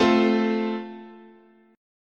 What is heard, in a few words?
A Minor Sharp 5th, guitar chord